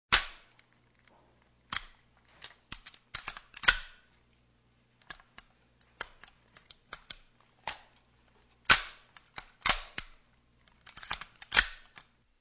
armi

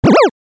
suoneria botton; beep

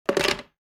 suonerie telefoni fax; phone

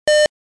suoneria beep

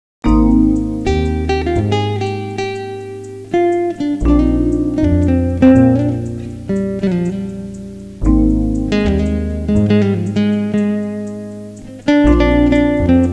suonerie musica jazz

